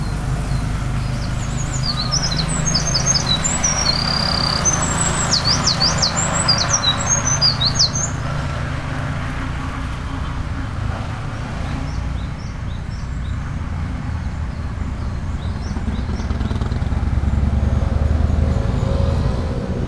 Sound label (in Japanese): misosazai koruri; chausuyama